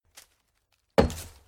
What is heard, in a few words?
Single Chop